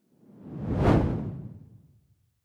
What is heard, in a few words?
whoosh simple hard low